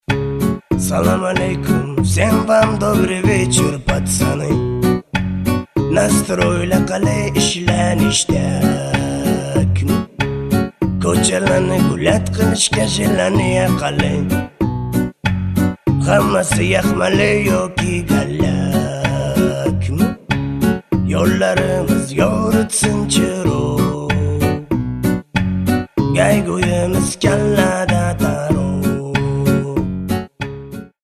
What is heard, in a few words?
Ringtone